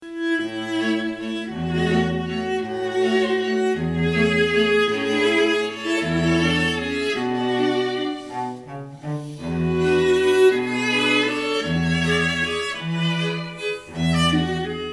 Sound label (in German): Klingelton Viola